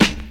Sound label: dry rap steel snare drum C sharp key; Mono